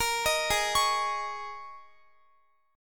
Ab Suspended 2nd Suspended 4th Ab down Guitar; arpeggiated; Absus2sus4

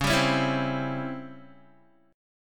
Db Minor Major 7th Flat 5th Db Guitar, chord; DbmM7b5 strummed; JGuitar

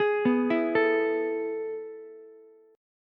A Major 7th Suspended 2nd, Ukulele; AM7sus2 arpeggiated; JGuitar

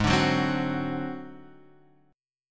G sharp 7th Flat 5th G sharp, down

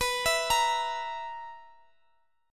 B Major 7th Suspended 4th B down Guitar Standard; BM7sus4 arpeggiated; capo=3

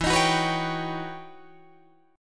E Minor Major 13th E Guitar DADGAD; EmM13; strummed

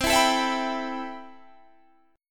C Major 7th Add 6th C

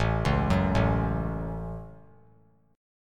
A 6th; arpeggiated

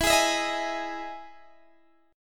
E Major 7th E down Guitar DADGAD

